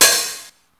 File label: open